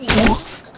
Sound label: vomiting sound; theme hospital